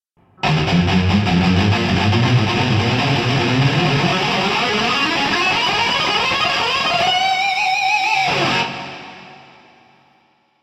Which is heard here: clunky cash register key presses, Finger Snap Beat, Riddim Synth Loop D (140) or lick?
lick